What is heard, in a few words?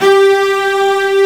G 3-CB S.H, C-BASS SECT; AKAI S6000 CD-ROM - Volume 1; Strings